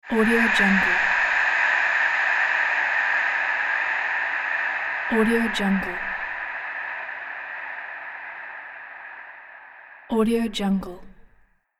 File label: sports crowd background